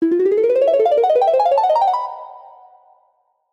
Jingle; DK64

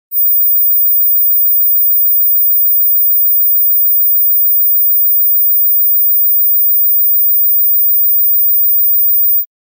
Mosquito Kids Only

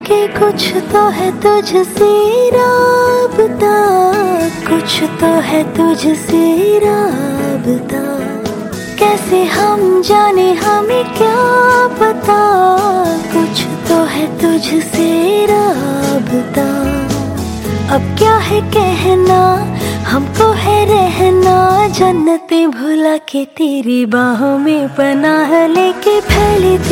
female version

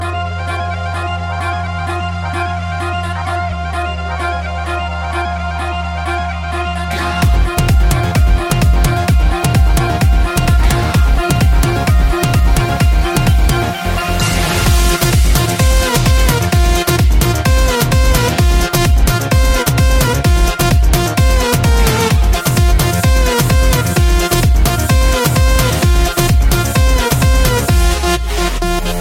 Remix Music; Desi

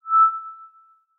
Pixel note

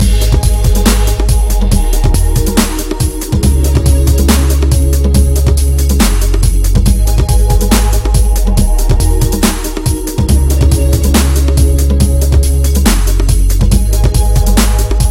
Nighttime Dub

